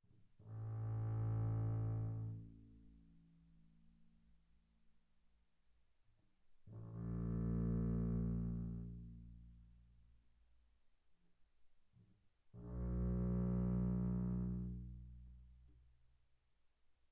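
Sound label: Bass arco sul A pianissimo A1 B1, double bass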